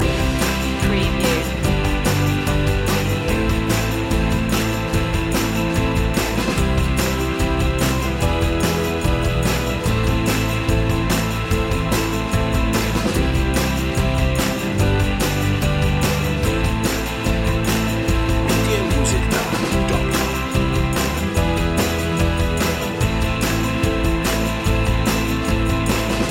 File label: snakes and mountains loop